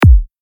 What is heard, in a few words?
Kick Psytrance